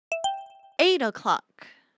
Hourly Alert

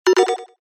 activate, notifications; HTC One-X

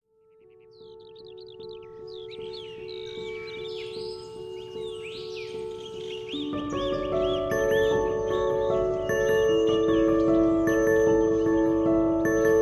Flourish, alarms; Huawei Mate XT Ultimate

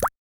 Water Drop Notification Day; Xiaomi Redmi-Note-8-Pro

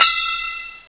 time bonus; scoreland

